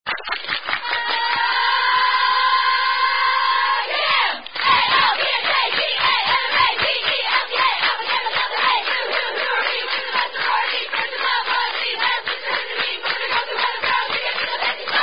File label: Alpha Gam Cheer, Alpha Gamma Delta Sorority Chant